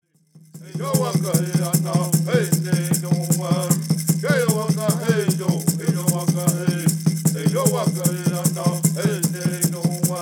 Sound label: Peyote Song